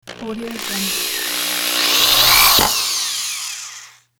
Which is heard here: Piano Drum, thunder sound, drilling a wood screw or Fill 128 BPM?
drilling a wood screw